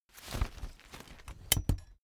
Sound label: umbrella big open full